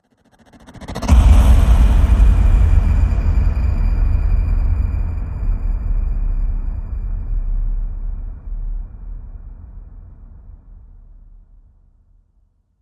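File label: Hit Sttr, stutter hit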